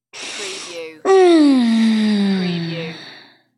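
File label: Female Relax Sigh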